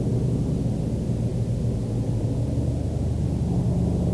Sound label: cave drone